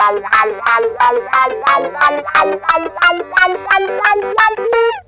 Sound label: gtr, guitar